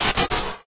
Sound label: Morph In